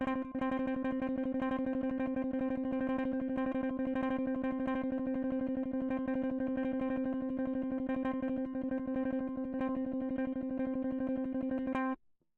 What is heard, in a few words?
electric